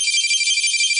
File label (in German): boss leben; kirby s dream land